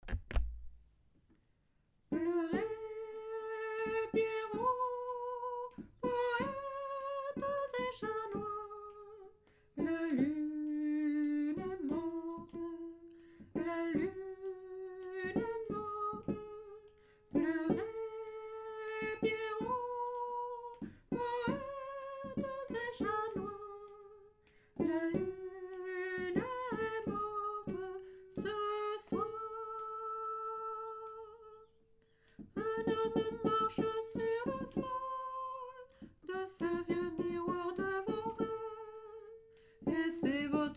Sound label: mezzo